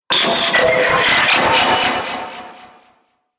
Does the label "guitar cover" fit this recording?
No